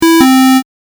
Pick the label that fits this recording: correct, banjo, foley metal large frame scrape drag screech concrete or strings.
correct